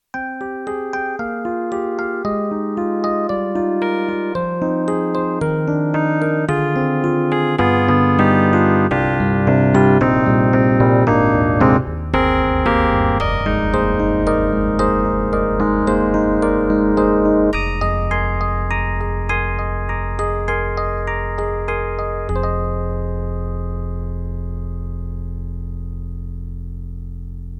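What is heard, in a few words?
YAMAHA MU1000EX Diploid, piano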